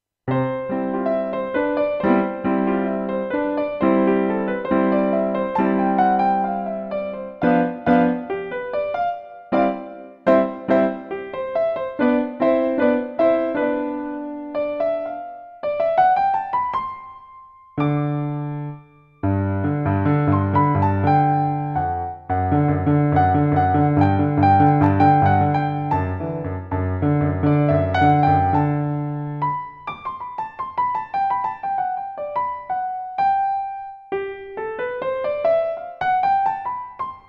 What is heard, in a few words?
piano practice